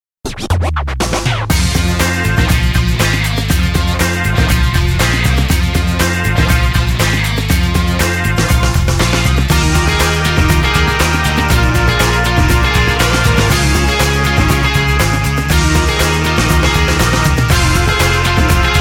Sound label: Breakbeat Soul